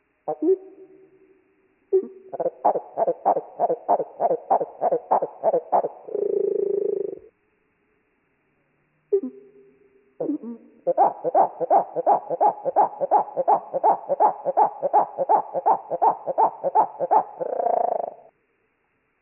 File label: slowed 8x East genes West songs, Marsh Wrens; Donald Kroodsma